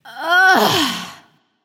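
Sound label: ugh, female; smite; voicepack; hera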